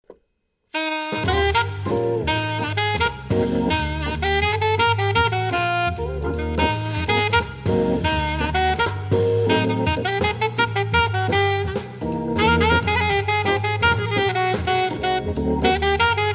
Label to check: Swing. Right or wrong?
Right